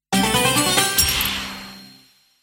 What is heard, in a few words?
block break; medallion; Fantasma